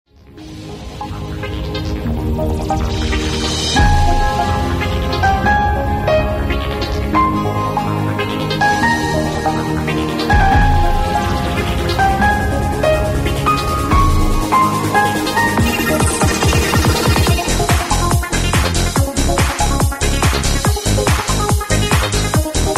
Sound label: Breeze Instrumental